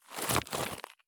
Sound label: turn gravel; Escape from Tarkov